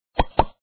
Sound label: chest; logika